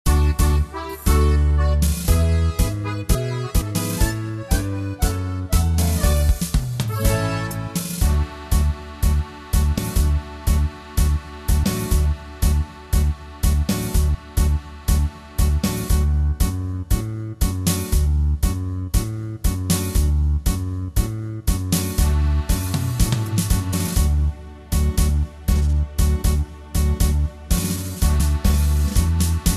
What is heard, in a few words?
tango